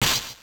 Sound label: player hit; MMBNMMOP